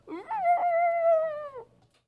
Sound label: wouh